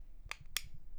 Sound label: Flashlight; HapticSamples; Objects; Feel; NiceVibrations